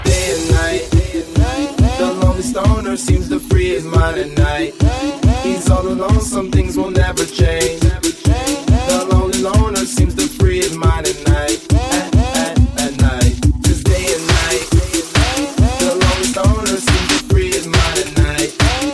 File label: bassline